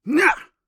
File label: Warrior Grunt Physical; Realm Royale; Hi-Tek Trooper